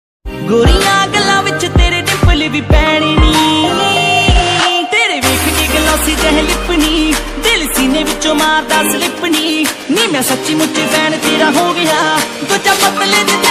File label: punjabi ringtone new punjabi song ringtone love ringtone